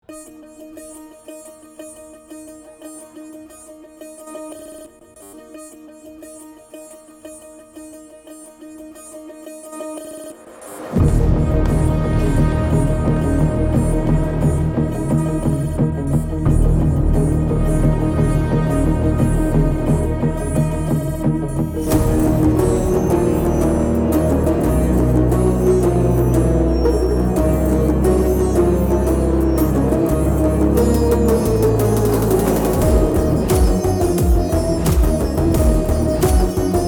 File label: Villain BGM Mix; Bhagavanth Kesari